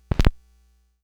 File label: Needle Pop, Vinyl Record Samples